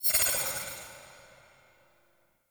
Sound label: Fairy Weapon Shot Tail